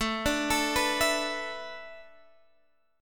A Suspended 2nd Suspended 4th A Guitar Half Step Down, chord